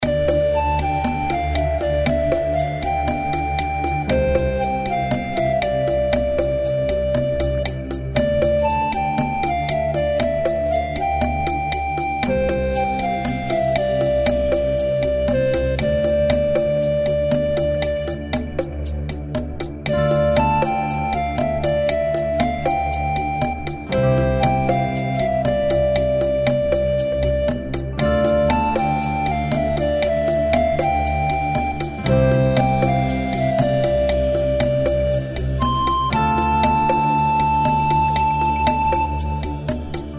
The Village Looping

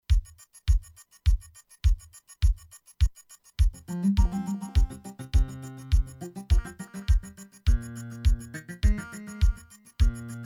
Trailer Music

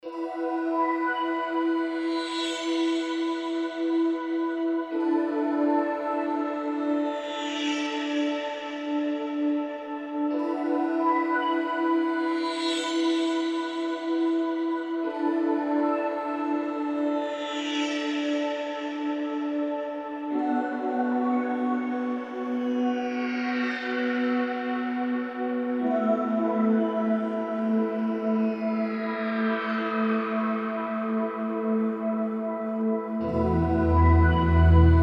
The Old Parolee Looping

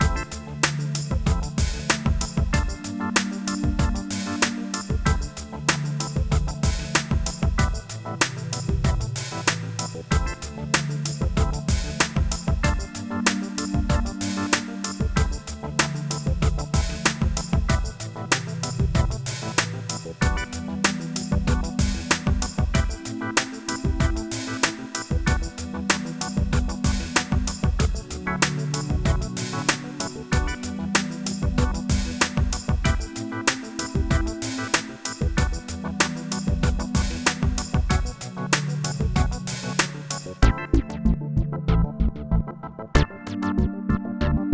Electric Drizzle, sci fi

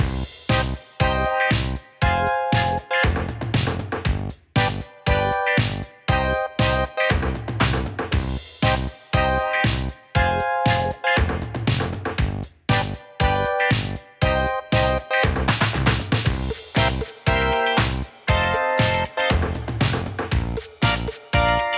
Techno Pixel Monster Dance Party, chiptunes

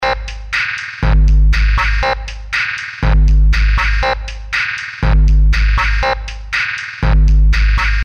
Weird Lab Loop

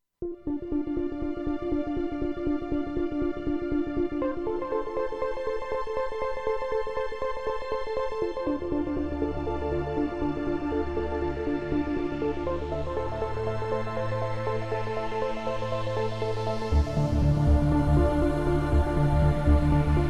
Sci Fi Open

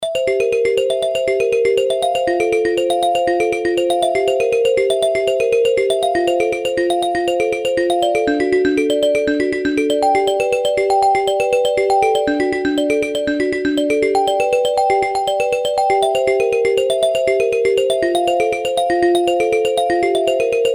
Ice Cream Truck Looping

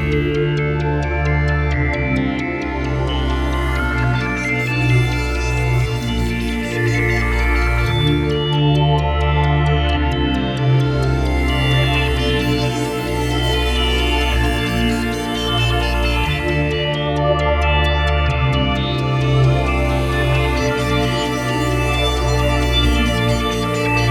Break Down, sci fi

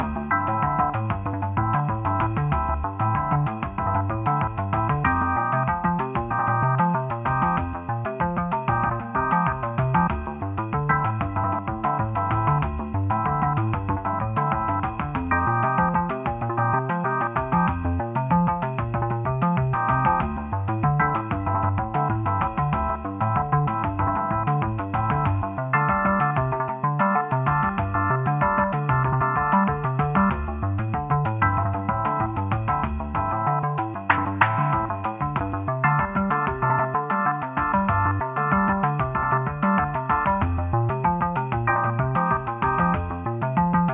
Pixel Balloons, LoFi, puzzle music

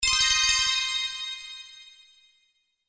Bell Chord

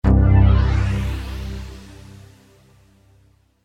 Power Up

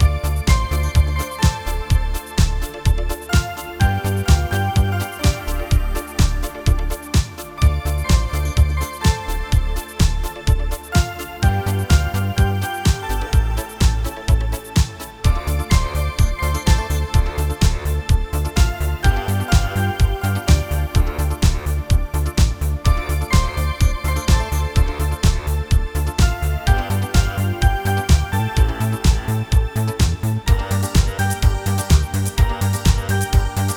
Cyber Rain, sci fi

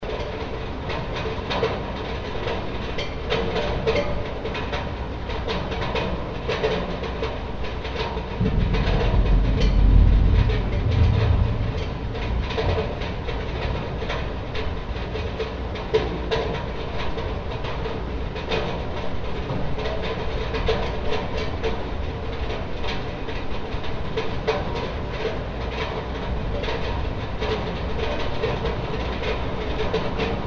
Rain Tapping on Metal Chimney

